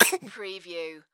Baby Cough; sound effects bible

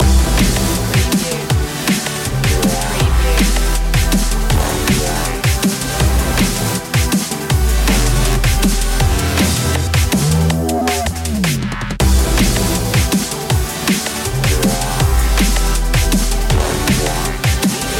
moving up loop

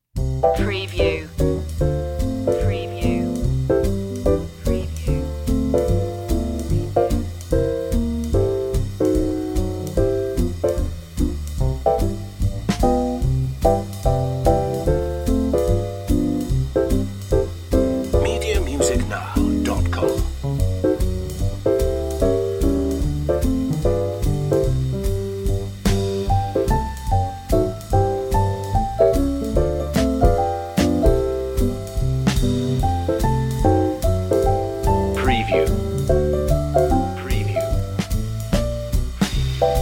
playful sunday no lead

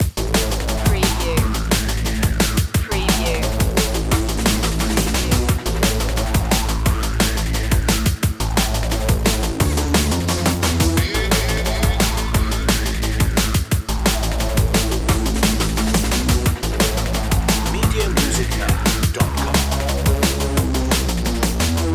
massive loop

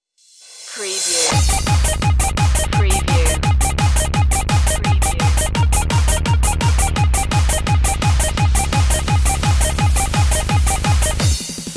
bubble gum beats

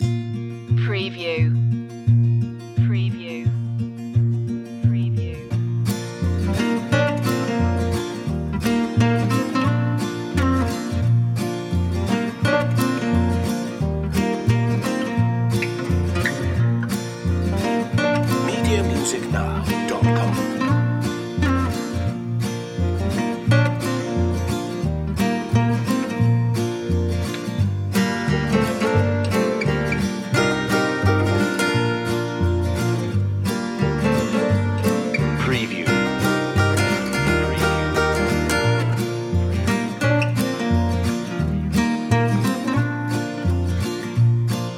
yonder the hills no drums

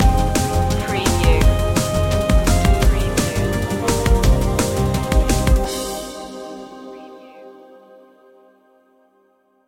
chillax stinger

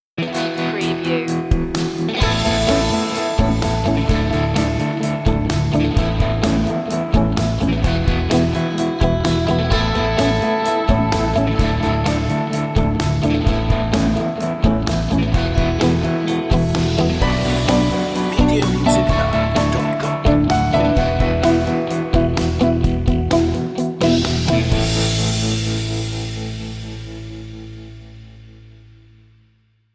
sidewalk rock

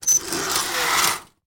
Hangers Metal Slide

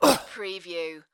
Pain Umph, Male Painful Grunt, Hurt

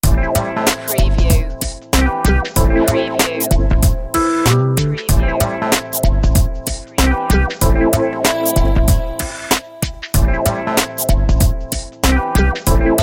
funky summer loop

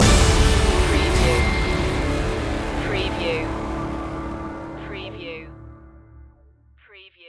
arcade rock stinger

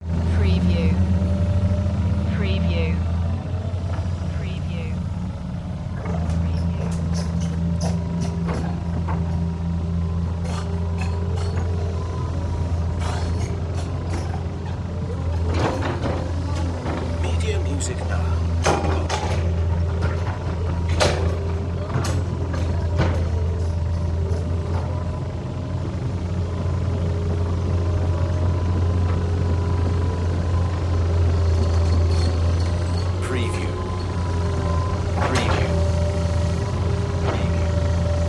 Digger

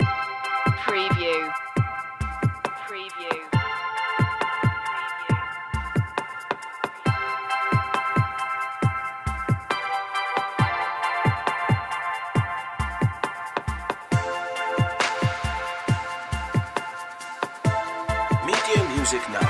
tones of aqua no synth

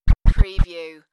Turntable Scratching

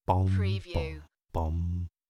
Male humming; beanstalk audio

